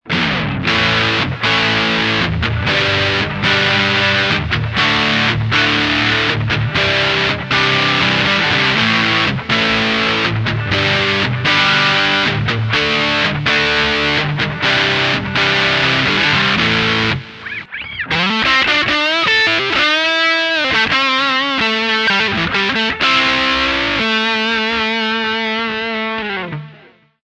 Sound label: rolling mill neck dirty